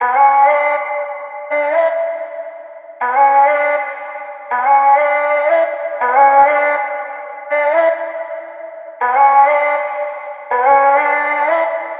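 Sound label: vocal chop, 80 bpm, Bm; Bedrock; Slate Digital Omnivox Vocal Sample Pack; Chops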